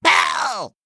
Male Murloc Death

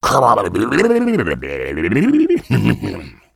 Shoalfin Murloc, Play

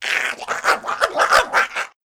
Imp Enter Play

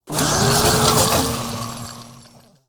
Recyclebot Attack

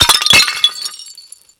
Plate Death

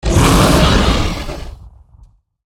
Dragonmaw Scorcher Attack

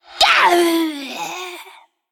Female Undead Death

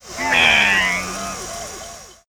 Alarm-o-Bot Enter Play

